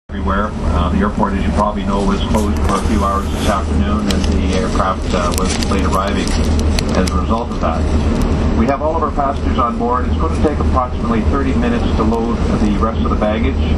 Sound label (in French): dans l avion